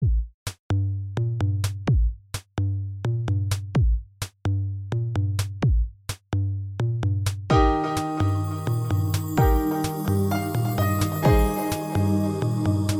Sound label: Two Note Chill Practice Tempo E Flat